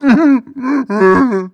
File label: breath long, zombie